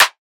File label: Clap, Trap Drums; One Shots; GhostHack; Drum Mega Bundle; Achieve